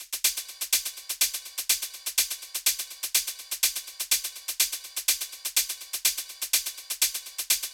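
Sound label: Drum Loop Progressive House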